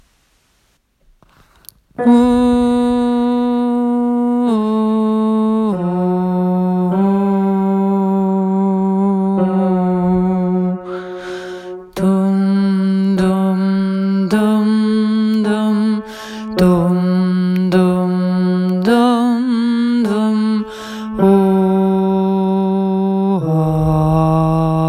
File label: bass; åfjord